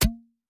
Water Splasher; gamerip

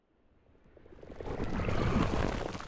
Void Appear; viewfinder

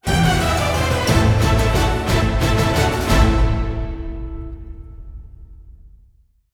Sting Levelled Up Ship

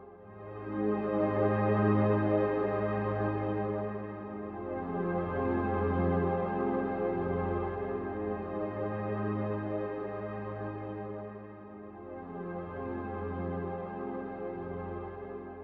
atmo 1 32 beats

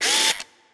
Pistol Hero Motor; Legend of Keepers

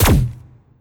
Plasma Shoot

